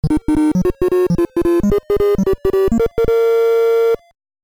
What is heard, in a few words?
Marlin Jump; The Blue Marlin; NES